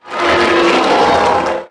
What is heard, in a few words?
connect lost; axisdemo